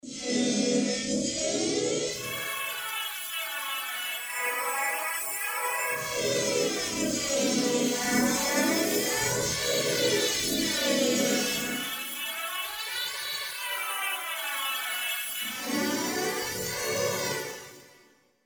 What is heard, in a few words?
flanged, Yamaha TG-500